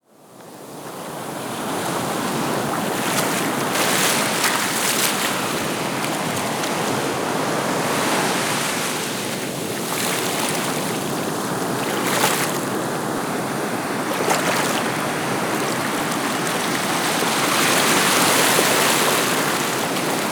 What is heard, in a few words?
SEA; USB Soundscan vol.45 - Indian Traditions; AKAI; AMBIENCE2